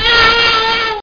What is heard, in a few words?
baby cry; Wired for Sound Pro CD; Aristosoft, Inc.